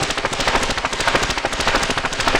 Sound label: Rhythm Noise, 100bpm; rhythmic inspiration